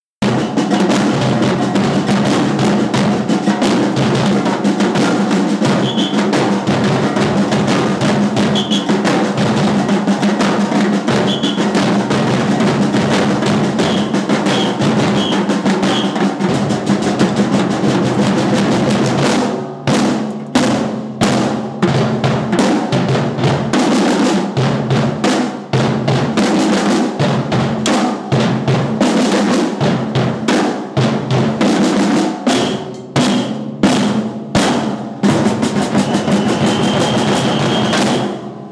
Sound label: Crescendo and End Bass Drum; samba